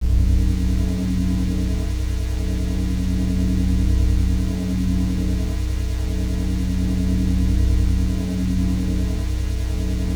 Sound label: teleport; teleport effx